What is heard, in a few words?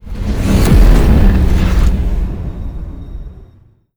sfx battleship moving out; Stellaris; nemesis